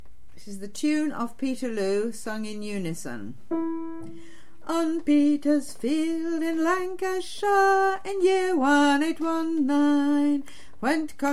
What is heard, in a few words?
Peterloo Tune